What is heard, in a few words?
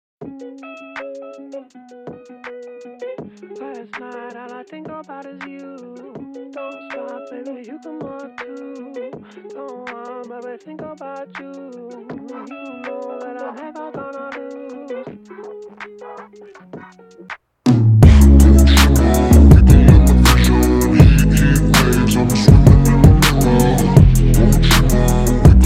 THEME BASS BOOSTED